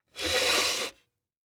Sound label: Household Phone Slide On Cement Rotary